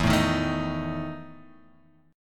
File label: E Major 7th Suspended 4th E down